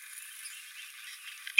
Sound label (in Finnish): punatulkkuparven aantelya